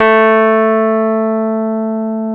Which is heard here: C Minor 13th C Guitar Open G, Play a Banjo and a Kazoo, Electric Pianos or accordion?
Electric Pianos